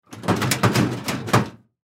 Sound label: File Cabinet Metal Locked Drawer Grab Pull Multiple; Office; Blastwave